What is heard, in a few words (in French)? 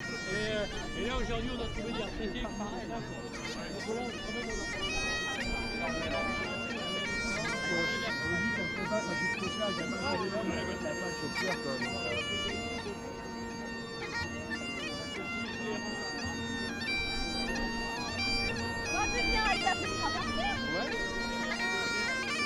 Cornemuse; Manif Rouen